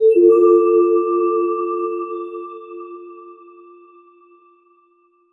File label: objective start ui